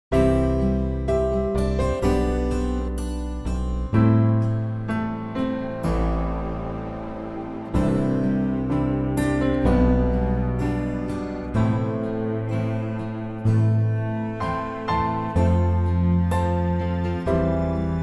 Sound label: C acoustic